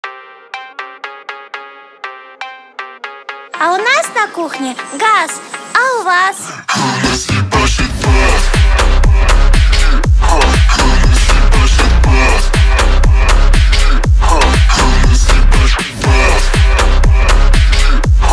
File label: Brazilian Phonk Remix